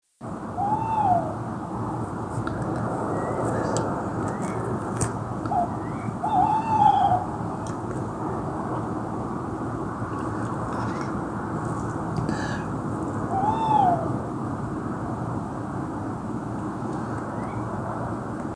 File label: Tawny male